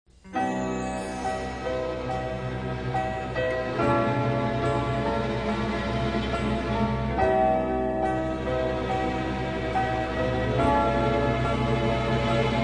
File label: Orchestra Panpipes, Saviour, Like A Shepherd Lead Us (Medley)